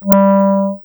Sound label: TS filter clarinet A3 output